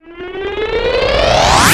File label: Misc Short FX; Vengeance - Essential Clubsounds Vol.4; Effects